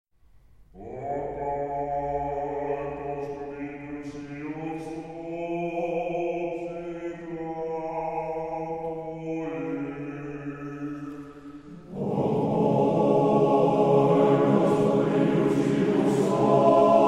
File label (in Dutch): Byzantijns Mannenkoor, Pokoj Gospodi volgens traditie; Hoorns